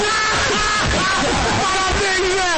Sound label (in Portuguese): risada kkkkkkkkkkkkkkk